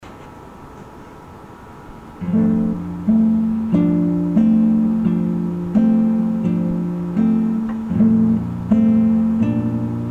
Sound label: Guitar